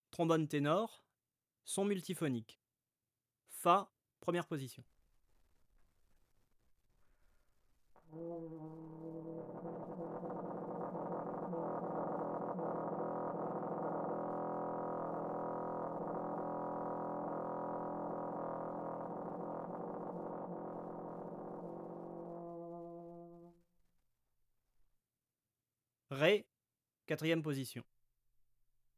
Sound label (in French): ténor sons multiphoniques, trombone; Textures; Sons complexes faibles; traite orchestration